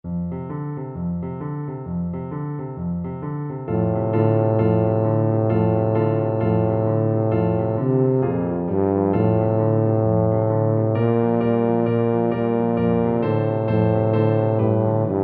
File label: jingle bells boogie, tuba